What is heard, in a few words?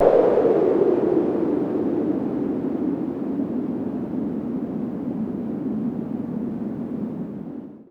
Yamaha CS5, Filtered Noise